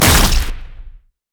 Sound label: bola strike; rengar